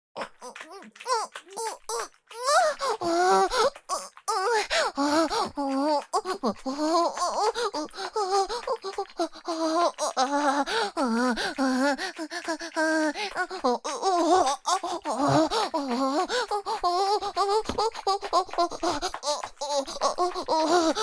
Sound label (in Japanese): オホ声（SEあり）娇喘